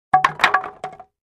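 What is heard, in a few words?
2x4 Several Planks Dropped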